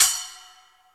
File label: CYMBAL, DRY; Crypton - DrumBase5000; DRY KITS